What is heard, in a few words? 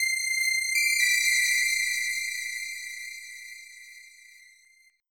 harsh